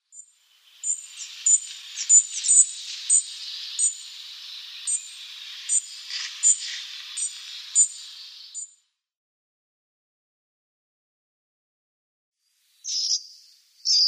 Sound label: Asian Brown Flycatcher calls